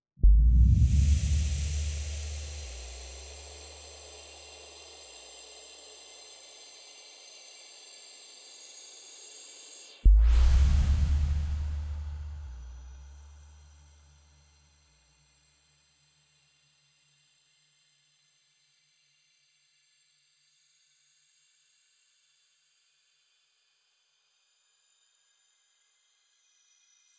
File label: Fruit Fill Long; Forest SFX